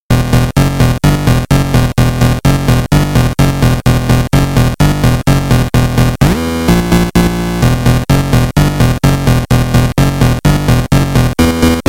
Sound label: wave guitar circuit bent gameboy noise; weeklybeats-2018